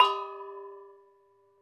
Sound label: Sumatra Gong; Club-50 - Foundations Roland; ExotcMetal